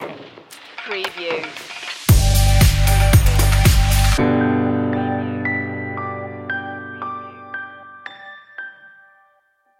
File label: storyteller stinger; agreeable